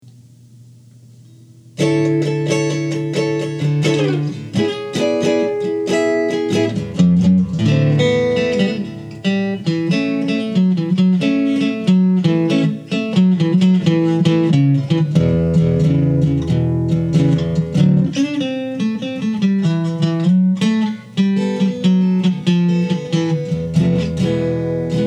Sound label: Archtop electric guitar; This fine Archtop electric guitar was made in partial fulfillment of the requirements for the Master of Lutherie Certificate; Earle Custom Lutherie